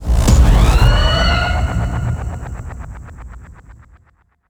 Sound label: Ui Continue Appears; Rampage